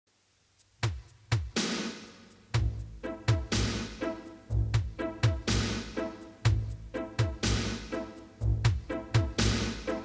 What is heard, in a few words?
Classical Vilon Arpeggios